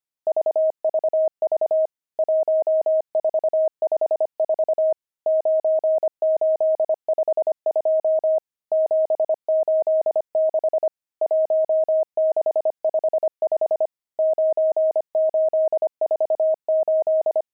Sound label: pangram, intermediate pangrams files